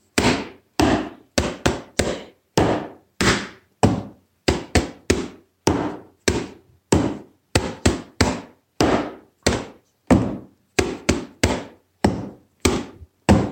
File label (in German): Papiermusik